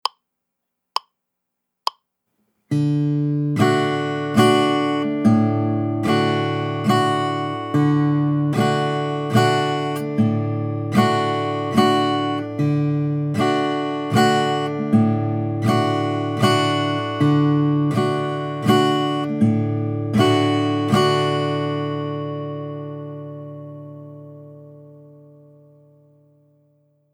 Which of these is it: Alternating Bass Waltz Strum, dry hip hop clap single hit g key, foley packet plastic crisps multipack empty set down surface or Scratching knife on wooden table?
Alternating Bass Waltz Strum